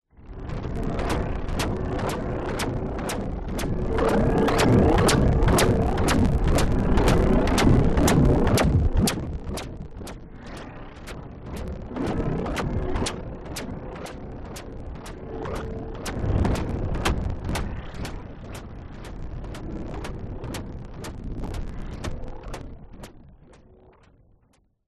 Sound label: sound design grainy lo fi drone with laser style blips; scott lawlor